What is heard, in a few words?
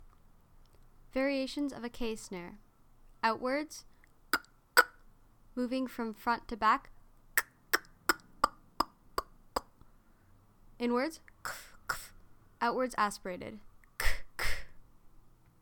Snare